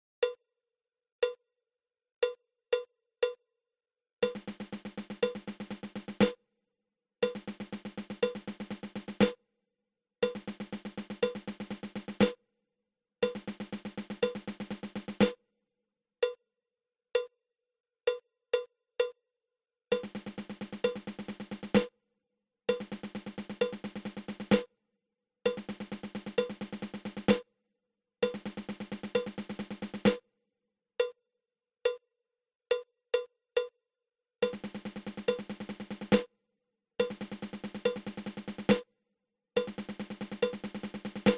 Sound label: Seventeen Stroke Roll